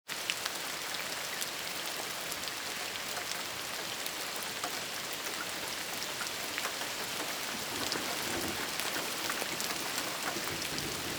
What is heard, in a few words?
Stormy Rumble; PomoLuxe